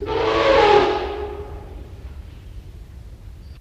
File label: Elephant Trumpets